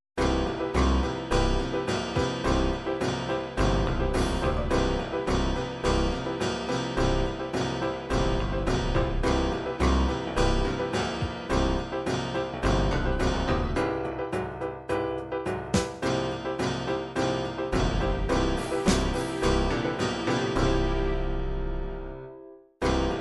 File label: dance celtic, midi